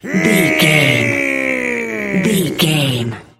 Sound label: voice male growl effort